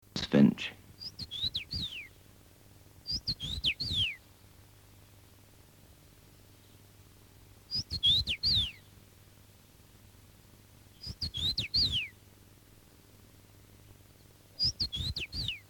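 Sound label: obyknovennaya chechevica golos, common rosefinch voice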